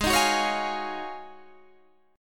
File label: Ab 13th Suspended 4th Ab; Ab13sus strummed